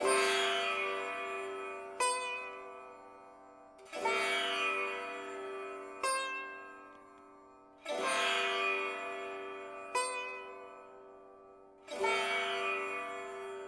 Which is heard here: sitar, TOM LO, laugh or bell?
sitar